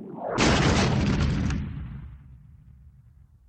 drop bang; sfx

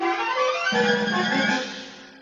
dramatic music mine cart; sfx

